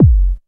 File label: bass drum deep, techno trance drums